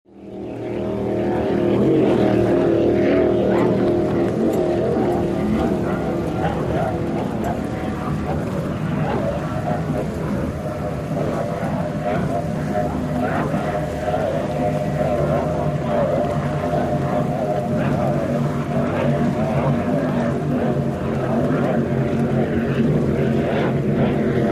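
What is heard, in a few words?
Power Boat Race Ambience, off shore power boat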